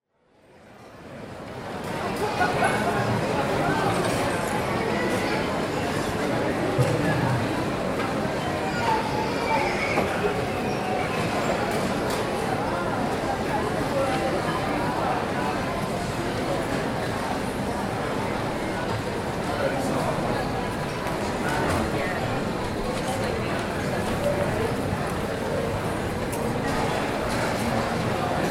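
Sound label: general background without announcements England; Звуки аэропорта; Звуки атмосферы терминала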